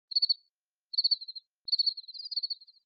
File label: reactions crickets; jiti meet